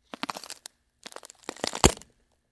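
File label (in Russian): хруст ломающихся костей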